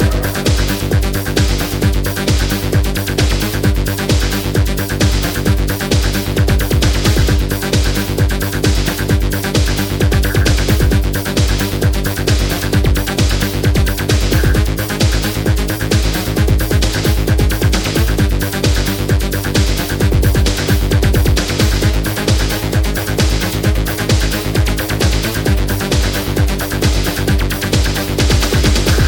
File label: loop